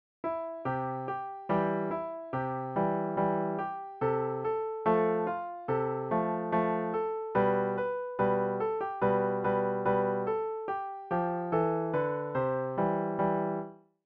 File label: the b i b l e piano solo